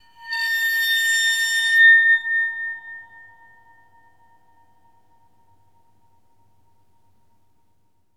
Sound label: WATERPHONE; ILIO; Synclavier Percussion 2; World & Orchestral